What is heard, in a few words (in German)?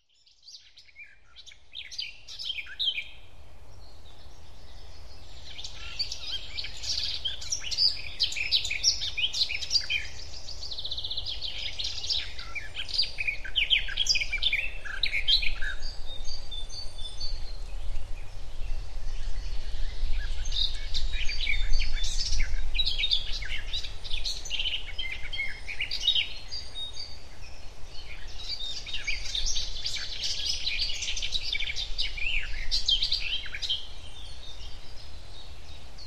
wiese